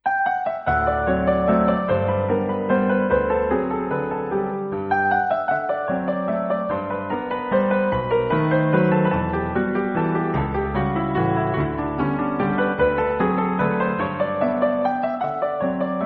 valse

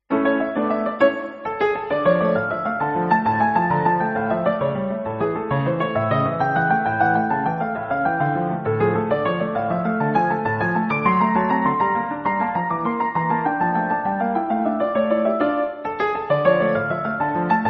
Tarantella

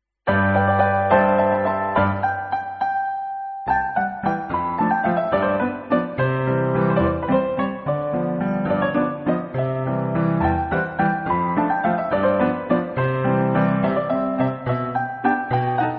jete

